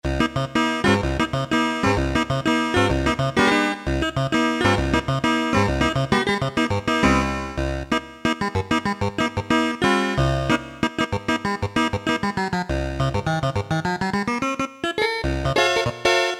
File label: clavichord, Casio MT-500; Tones